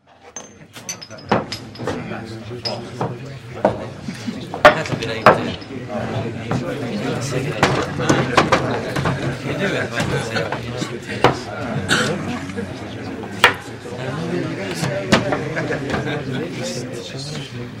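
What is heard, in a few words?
sounds of bar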